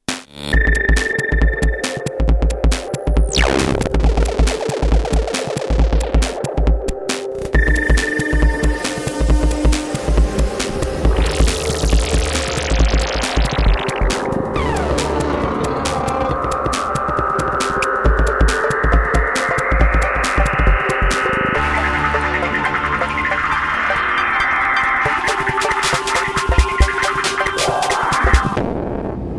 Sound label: SYNTHI VCS 3; emssynthi